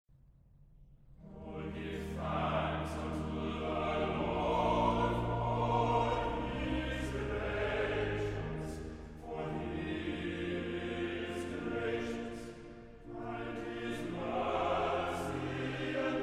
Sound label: Choir; Choir of St Johns College Cambridge; Nethsingha; O give thanks unto the Lord